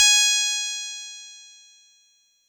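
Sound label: Fuzz Clav DX100 G#0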